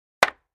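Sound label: 2x4 Hit Hammer On Cement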